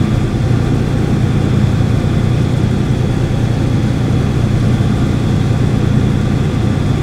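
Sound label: Ship Ambience; Jack Axe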